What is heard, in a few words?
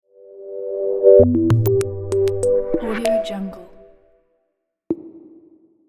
simple and elegant logo